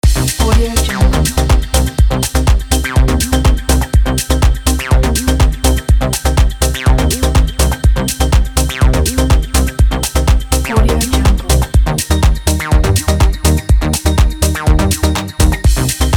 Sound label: sport workout techno loop